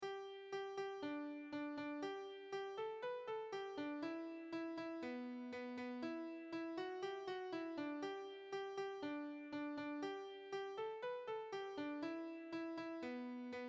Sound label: Boom Bop a Boom